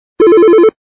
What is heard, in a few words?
Melody, ringtones